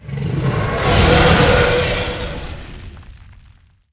roar, Monster; yakking

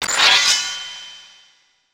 Sword Line; nox

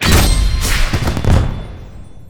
Combine Effect Sound

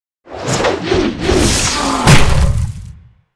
skill blow, Monster; yakking

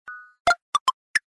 join; gamble

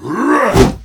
attack, Monster; develstriker

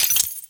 Consume Key

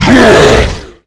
devil middleboss attack; Monster

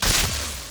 Bow; nox

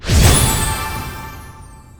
Guardian Stone Success Sound For Enchant

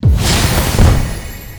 Item Enchant Effect Sound

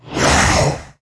atk, Monster; mell

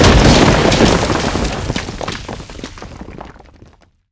bottom exp; Effect

